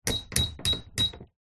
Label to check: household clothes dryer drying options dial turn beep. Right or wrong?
Right